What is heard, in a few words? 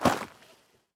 gravel, step; Minecraft; Votive World